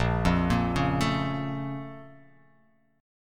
Bb Minor Major 7th, down; BbmM7; arpeggiated; JGuitar

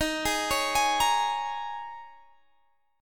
Bb Minor 7th, Eb; arpeggiated

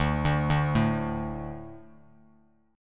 D 5th D, down, Bass Standard; D5 arpeggiated; JGuitar

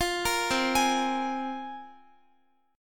C 7th Suspended 4th Sharp 5th, C, down, Guitar; C7sus4#5; arpeggiated; 4E,4A,5D,5G,5C,6F

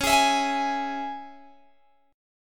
Db Minor, Guitar Open G

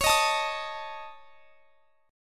C sharp Suspended 2nd Sharp 5th, C sharp; C#sus2#5; strummed; x,x,23,20,22,23

